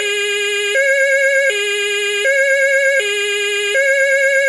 DE DIN Stadt; Tonfolgen Hella; Dachbalkensystem RTK 7 Konfigurator

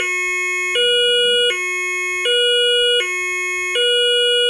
DE DIN Land; Tonfolgen Hella; Dachbalkensystem RTK 7 Konfigurator

